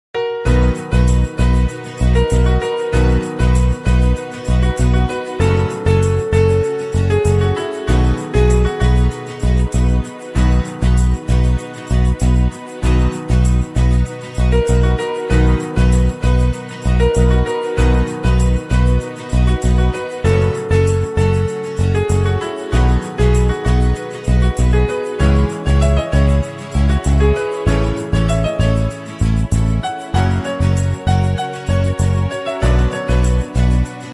tamil love song bgm ringtone; Varanam Aayiram movie

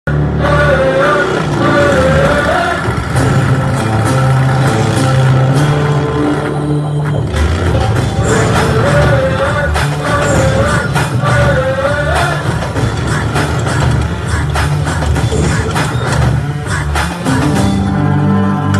hero entry bgm ringtone; Viswam movie

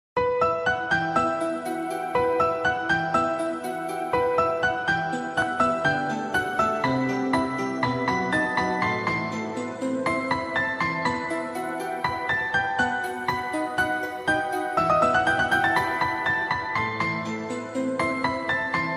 ringtone piano version sad alone ringtone; money heist

